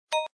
Bonus Peg Tick Sound; Chinese New Year Deluxe

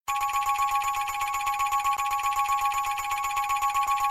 Win Animation Increment; Caribbean Poker

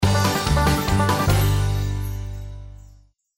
Win; Thanksgiving Blast

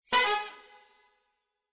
SFX Symbol Jackpot Win; Majhong Royale

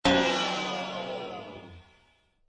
double up negative feedback; slots; Sushi Suprise

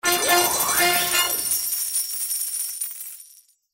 symbol jackpot animation, slots; Wildlife Adventure

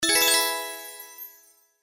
5 of a kind; Pirate Quest

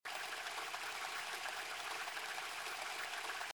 REEL SPIN, SLOTS; Panda Majesty

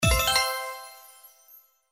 SFX Symbol Regular Win; slots; Panda Majesty2

